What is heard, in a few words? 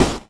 Snare, 8-Bit; Games And 8-Bit Kit Volume2; atomsplitteraudio; chiptune